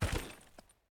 grass footstep

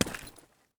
concrete footstep

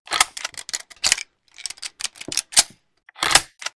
sv98 load; metrocraft; weapon

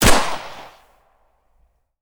gurza shoot, weapon

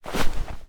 snow footstep

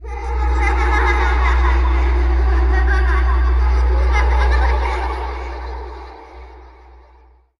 pipe laughter

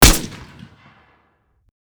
vssk shoot, weapon; metrocraft

